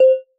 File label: marimba glasses; ゆめ2っき